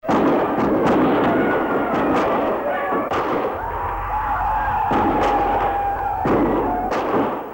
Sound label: Indians Attack